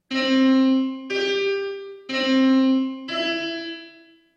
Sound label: Four tones that are acoustically consonant